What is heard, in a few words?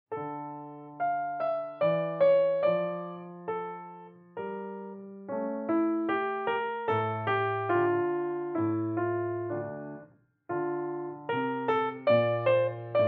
menuet d moll; muj klavir 2; cesta k interpretaci